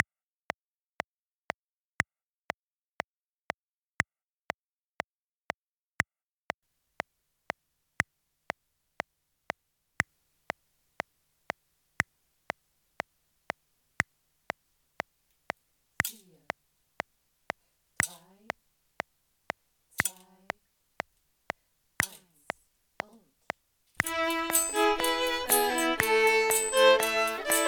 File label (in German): Play-Along 120 Caxixi und Triangel; Perkussionist innen